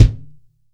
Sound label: TUBE KICK